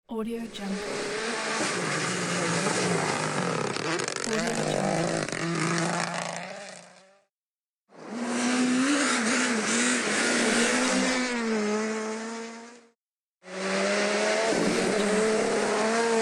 racing cars sound; djsapa

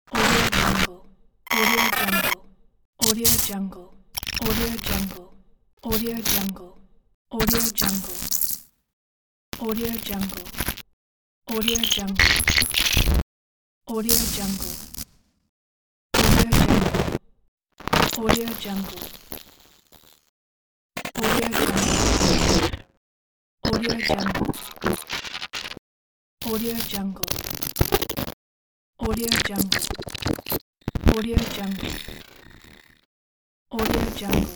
glitch

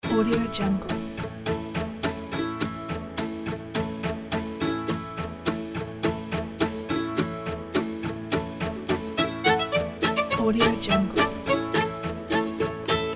bright country side

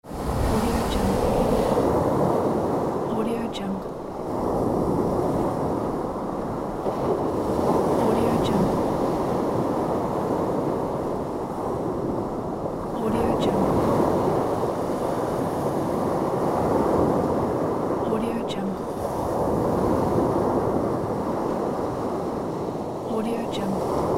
ocean storm

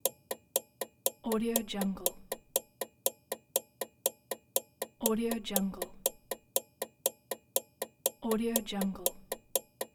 clock ticking

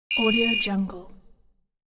scifi alert beep; hollywoodedge

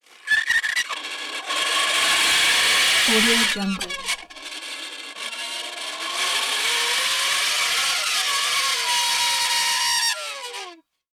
dry ice screech metal screech creak squeak; prosoundfx

